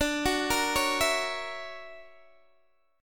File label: D Augmented Major 9th down Guitar Standard; D+M9 arpeggiated; JGuitar